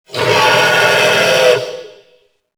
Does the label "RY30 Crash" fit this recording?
No